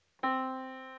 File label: yamaha Disk